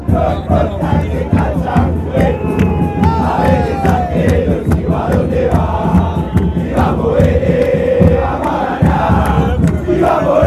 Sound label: it doesnt matter, chants